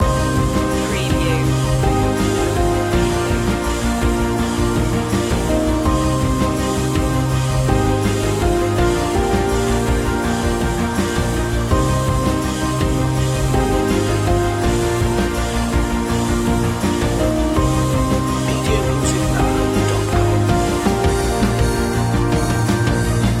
loop

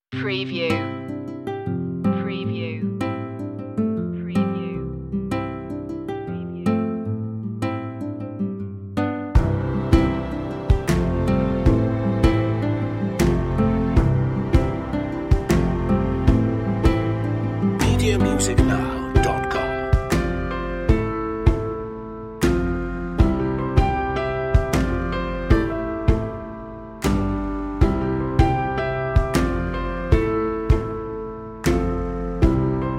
no percussion

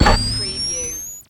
Monitor Turn On; sound-effects-bible

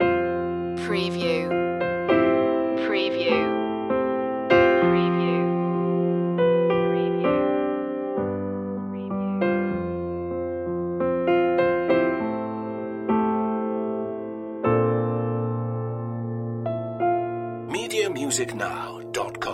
bittersweet memories loop

